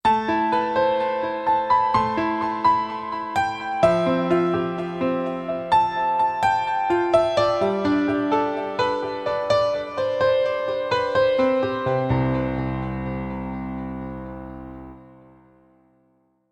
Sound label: Vintage Grand Child